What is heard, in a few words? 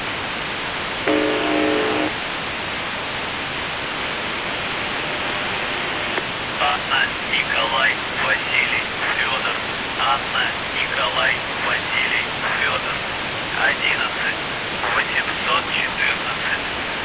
buzzer usb msg